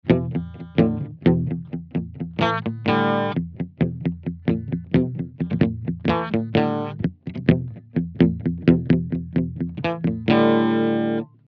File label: Marshall after